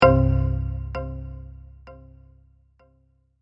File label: Xylophone Stinger, Single Xylophone transition with delayed fade